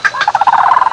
Exotic jungle bird

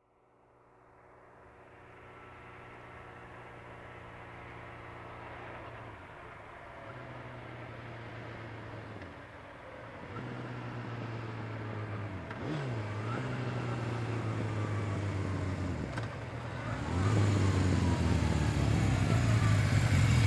Motorcycle approaching and stopping

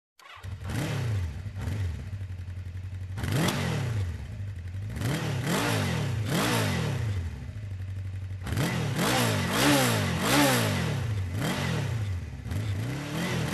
Motorcycle revving up engine

